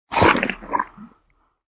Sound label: wound